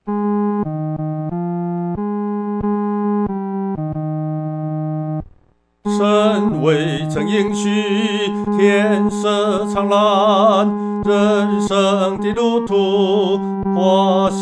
独唱 第三声

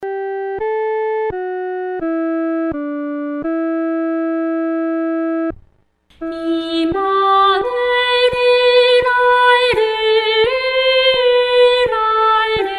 独唱 第一声; 以馬内利来临（一）

